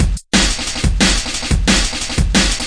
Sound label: break, DnB Breaks, 179 bpm; 141 to 185 bpm; Ethex